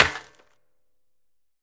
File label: scrap metal; casualtiesunknown